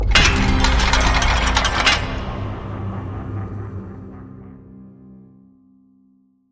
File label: intro Gate Open; bloodsuckers2